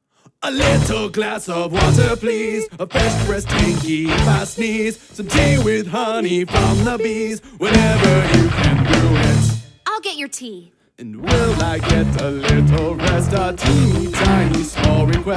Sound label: Metal; Pony Music Archive